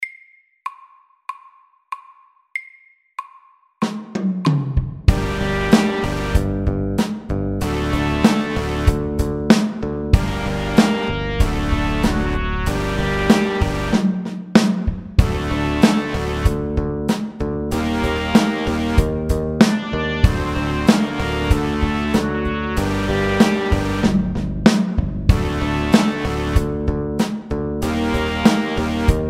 Elektrisk rock SAKTE

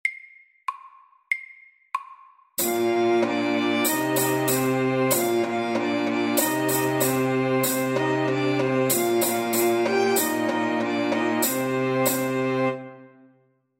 Folkedans SAKTE

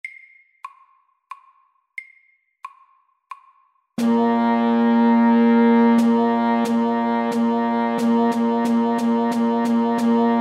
Rytme 3 slag i takten

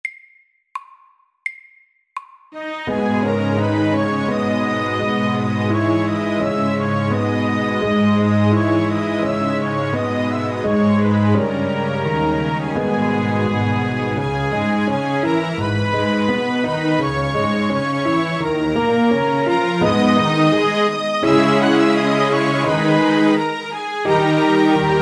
Gammel fransk melodi sakte

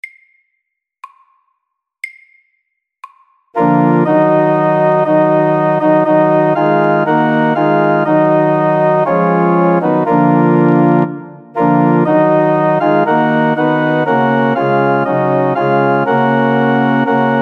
Marche funebre MIDDELS

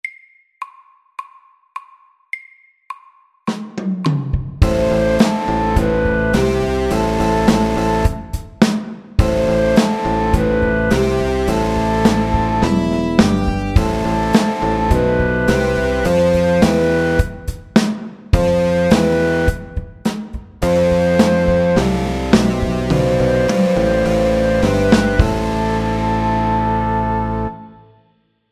Metal rock MIDDELS

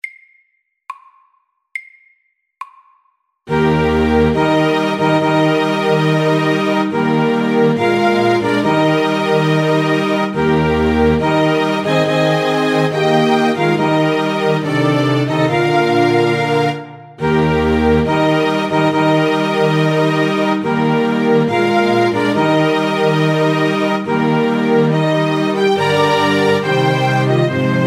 Brudemarsj MEDIUM 70

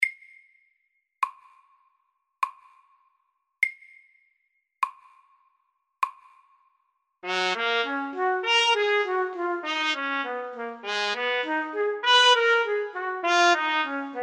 Etyde i Ass dur SAKTE

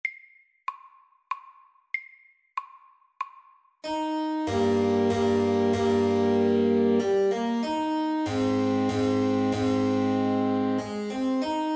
Menuett SAKTE

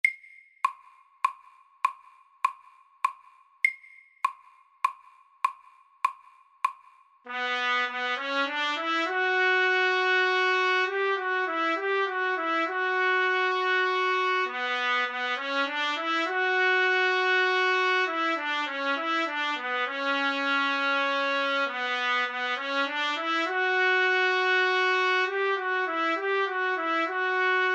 Etyde i Ciss moll SAKTE

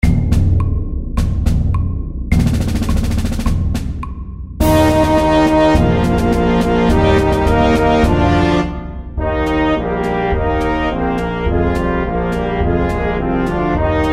Fanfare og marsj middels

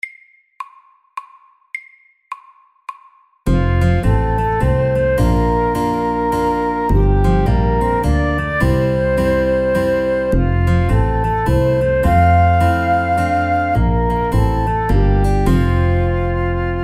Shuffle MIDDELS

